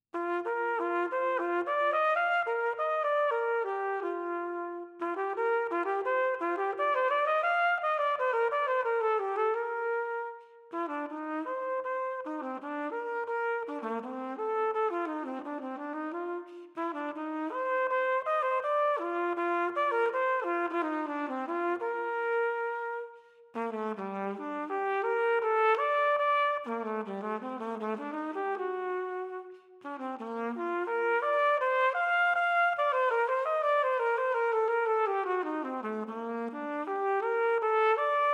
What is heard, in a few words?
Flugelhorn